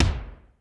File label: RY HOUSE TOM; Hollywood Edge; The Big Bad Beatmachine; RY-30